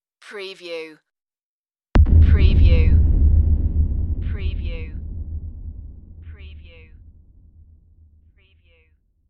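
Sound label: Deep Bass Hit Move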